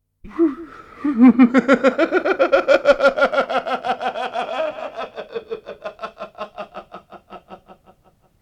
Crazy Mad Loony Man Laughing Creepy Laughter Some Echo; Mies Nauraa Seonnut